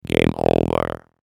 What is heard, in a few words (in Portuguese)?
robo gameover; efeito sonoro